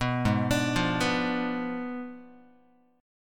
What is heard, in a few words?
G sharp Diminished 7th, Guitar; arpeggiated; 2,4,5,3,3,x; 4A,4E,5A,5D,5G#,6C#